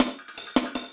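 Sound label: loop snare drum, 160 BPM, Drum & Bass; Ueberschall; Drum & Bass Resonance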